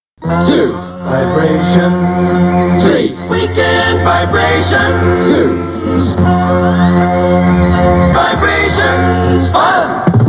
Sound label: Jingles